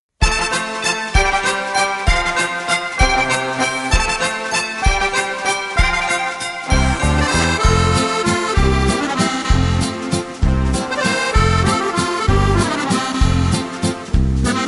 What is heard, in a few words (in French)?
Carillon d Alsace